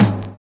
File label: LOW TOM; Univers Sons - Kitch 70s